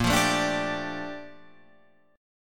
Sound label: A sharp Major 13th A sharp Guitar Open F; strummed